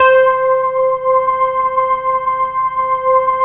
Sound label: SYN MORP, Roland; Sound & Vision - Gigapack II